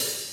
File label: Closed Hats